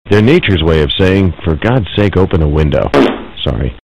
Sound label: fart